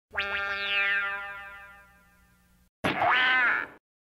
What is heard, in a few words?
stupid gag anime sound